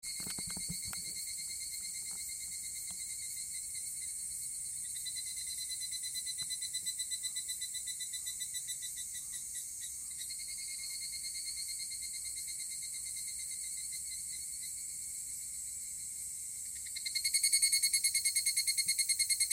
la forêt la nuit

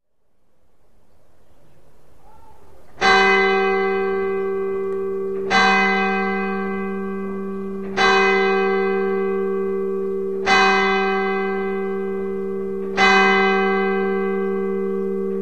midi

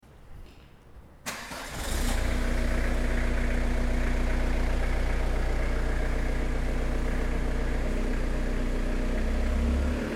garage voiture démarre

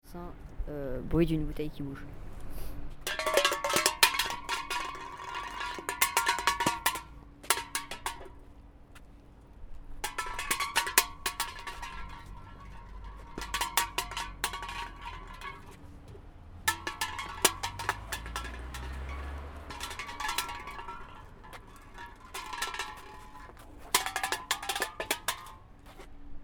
foot avec canette

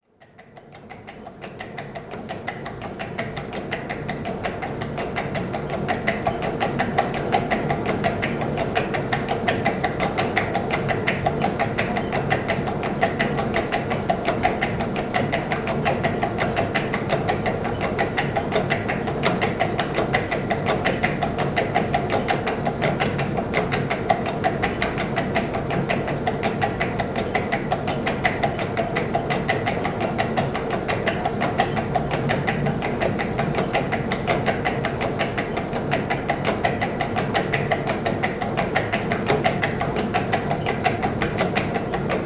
babillard meule moulin de vaudrey

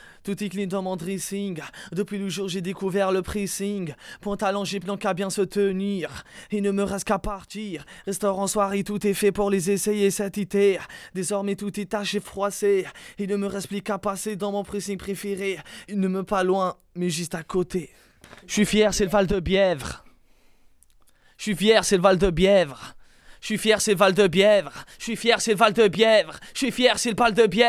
a capella

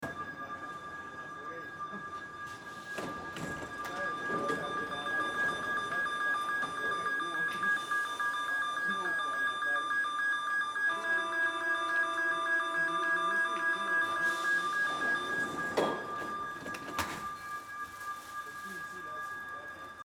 alarme metro ouverture porte

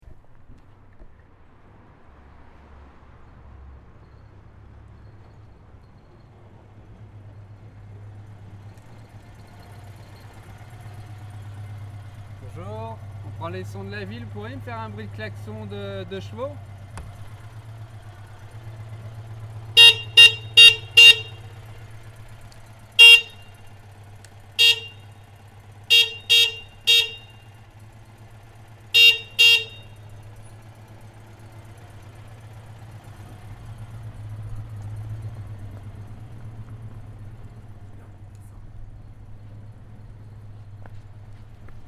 klaxon Deux chevaux

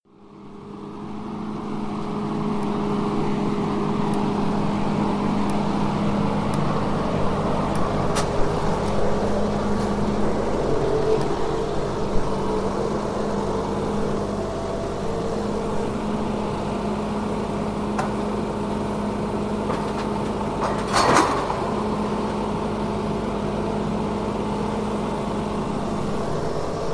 TIMBER JACK pose la grume

